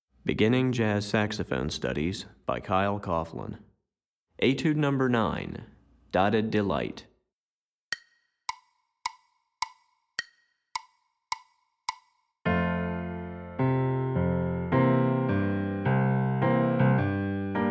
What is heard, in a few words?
Piano Part, Beginning Jazz Saxophone Studies Alto